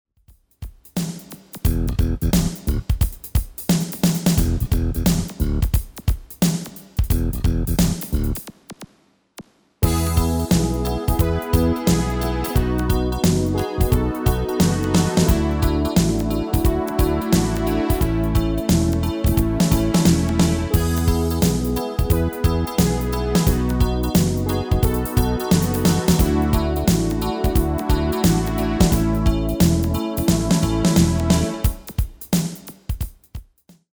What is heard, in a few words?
MIDI